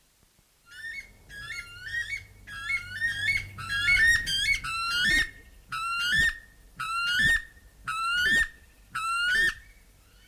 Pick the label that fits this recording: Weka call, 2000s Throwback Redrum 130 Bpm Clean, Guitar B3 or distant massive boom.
Weka call